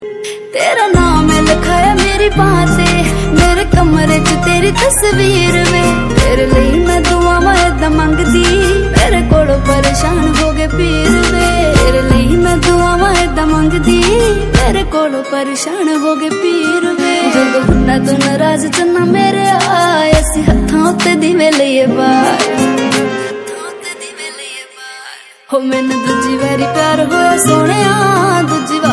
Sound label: panjabi song